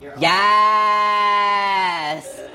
yaaaaas

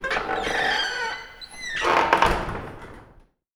door

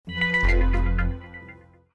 mysterious

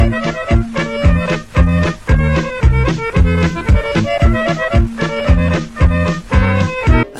russian accordion

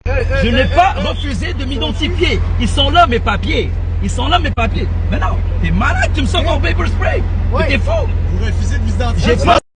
pepper spray

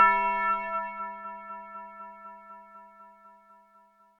bell

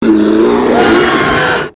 bear roar; do the bear roar

